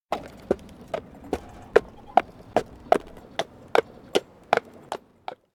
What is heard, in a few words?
steps slow; baron